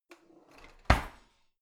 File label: old drawer creaky in half